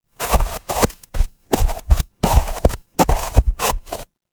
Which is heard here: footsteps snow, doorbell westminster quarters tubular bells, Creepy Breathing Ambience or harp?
footsteps snow